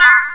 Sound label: WHIRLY